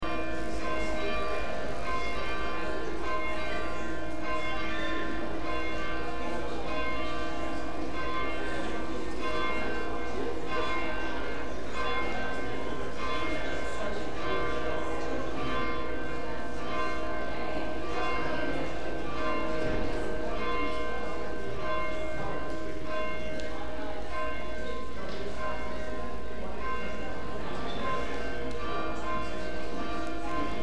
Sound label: bells at Fawley